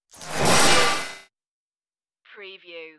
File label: starship door error